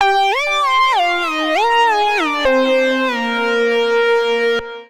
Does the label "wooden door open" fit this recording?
No